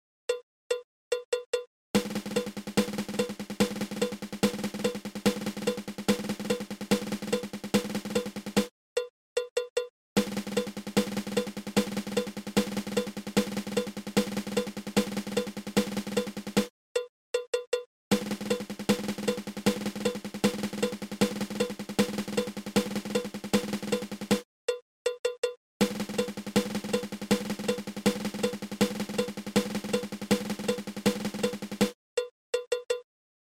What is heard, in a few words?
Drag Paradiddle 2